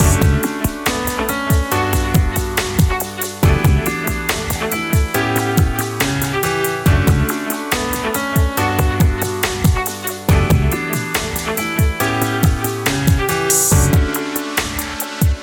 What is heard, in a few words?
Morning Blues tempo 140